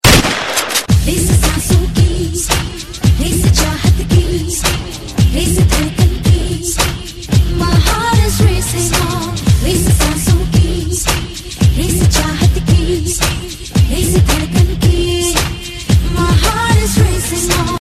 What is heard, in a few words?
Gun Shot